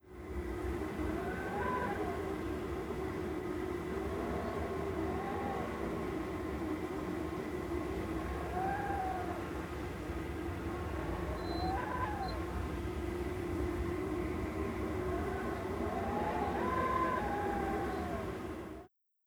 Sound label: Atacama Wind